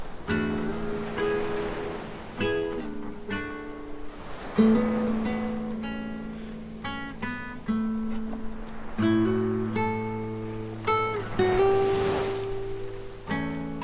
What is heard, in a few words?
shop Song; ludum dare 48